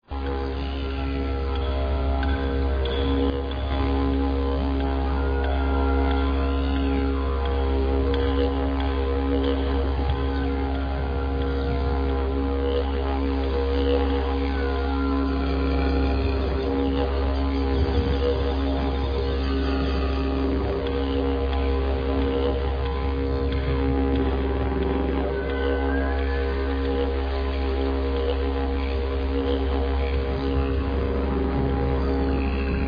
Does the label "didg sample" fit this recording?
Yes